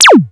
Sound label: fx, Yamaha Motif ES electronic & zap; Yamaha Motif ES-Series